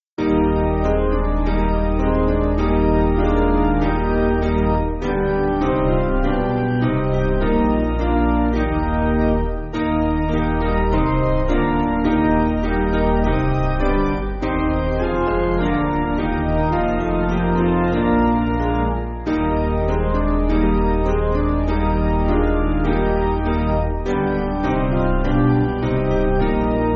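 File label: Piano, Music Backing Files for Churches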